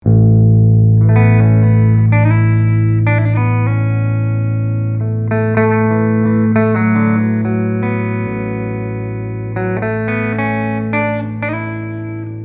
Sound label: clean bridge; vgs soulmaster vsm-120 select sbm